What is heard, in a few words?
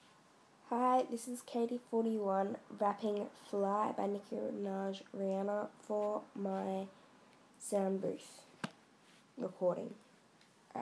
my rap